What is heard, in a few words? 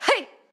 Swipe Normal; Jackie